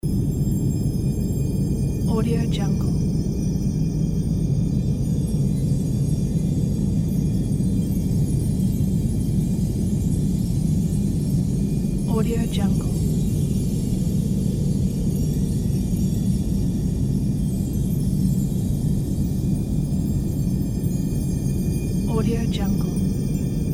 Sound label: arctic ambience